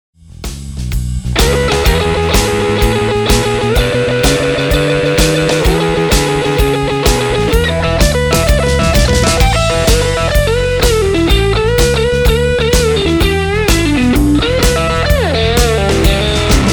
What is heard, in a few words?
lick; chitarra didattica